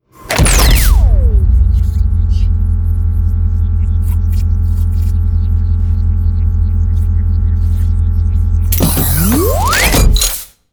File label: promo pad